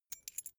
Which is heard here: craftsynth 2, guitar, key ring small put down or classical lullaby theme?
key ring small put down